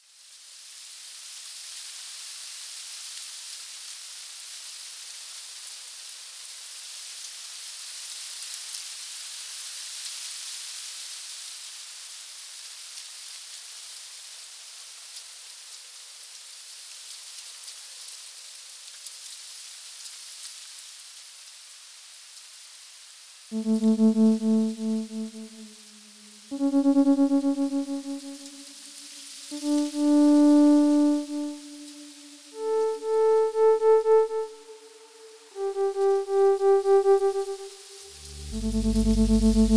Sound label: Ambient, melodic electronic composition.; streaks of amber leaves